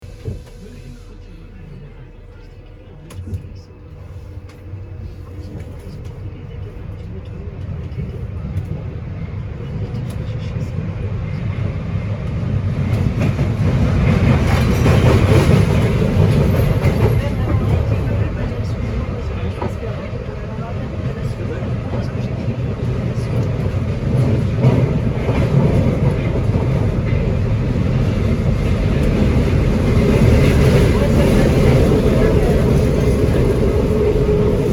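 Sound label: inside a Paris metro car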